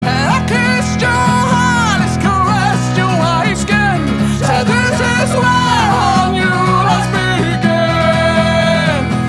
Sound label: Ringtone